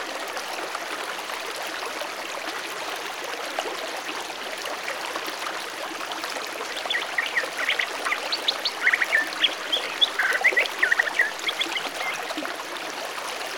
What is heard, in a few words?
Jungle Stream; generator dzwiekow